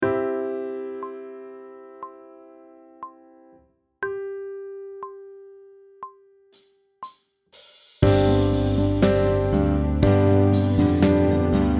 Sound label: Sight Singing backing track; pop vocals; grade 8